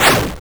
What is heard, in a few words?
Tidal Dive; Gloom